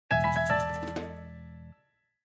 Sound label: Windows Logoff Sound